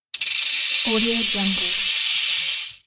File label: rattle snake